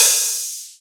Open Hihats, Cymbals

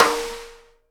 snare RIM RING, Live Snares

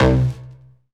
BS BS, Synth Bass FX; Roland LCDP02 Guitar and Bass

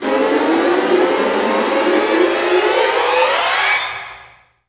STR SEC, STRING GLISS

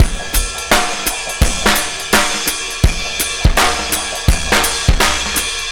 84 bpm, breaks; Zero-G Beneath the Planet of the Breaks

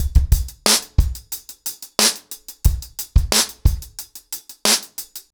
ACOUSTIC DRUMS, 90 BPM

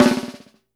BUZZ, SNARES; Sampleheads; New York City Drumworks VOL-1

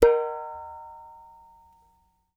Clay Pot, World Percussion One Shots; Sony CS

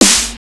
snare, Disco House; USB Soundscan vol.20; Fresh Disco House I; AKAI

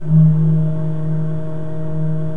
D2, Cathedral Organ; ILIO; Propeller Islands

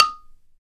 BALA, Balaphone

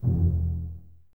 BSS E 1 Pizz, String Ensembles Basses; Miroslav Vitous

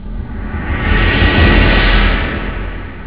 DARK timpaniroll; haunted; jazz jackrabbit 2

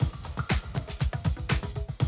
120, Classic Drum Loops

